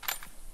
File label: pickup metal screws